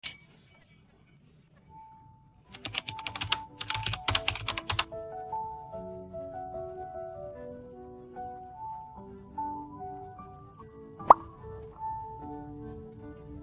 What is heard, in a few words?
no vocals